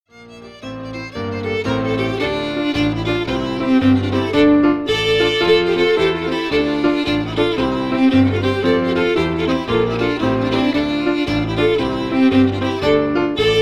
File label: Jigs